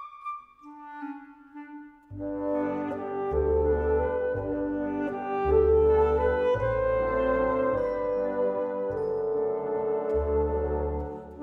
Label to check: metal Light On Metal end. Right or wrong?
Wrong